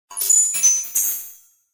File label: Spaceship Scanner